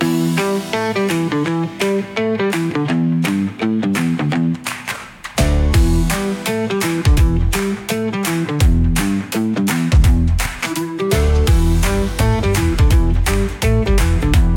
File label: Pop